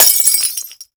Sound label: glass